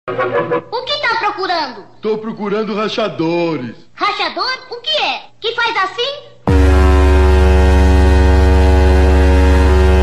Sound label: bass; saveiro open the theca